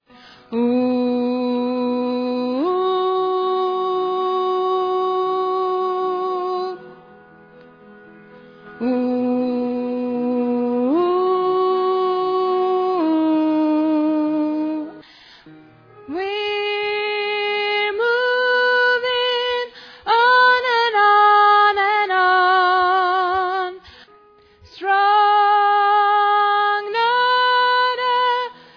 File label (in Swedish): bas, stammor